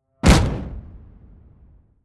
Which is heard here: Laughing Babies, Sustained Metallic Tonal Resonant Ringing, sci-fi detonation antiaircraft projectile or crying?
sci-fi detonation antiaircraft projectile